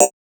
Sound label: bong